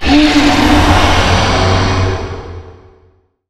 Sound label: charge cast; spirit breaker